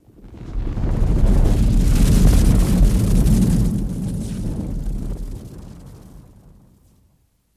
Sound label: Flame Bursts Strong, large